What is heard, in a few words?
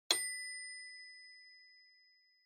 Metronome Bell Single